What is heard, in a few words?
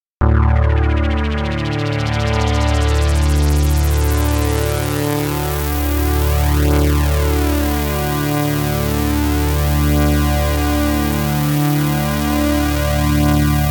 synthi